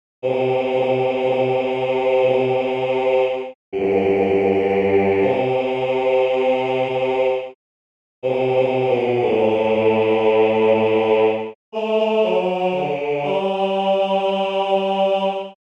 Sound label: ALLELUIA MAGNIFICAT BASSE